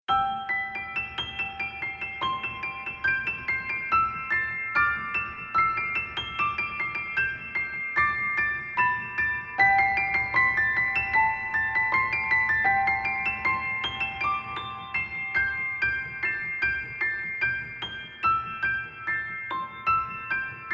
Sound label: piano